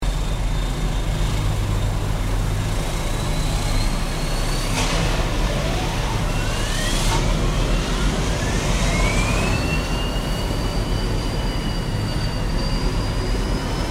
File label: ocean liner cruise ship engines engaging turbos whine bass epic rumble rev servos; ocean liner cruise ship engines engaging turbos whine bass epic rumble rev servos awesome cool